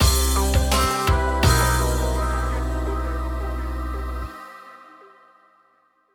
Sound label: lose stinger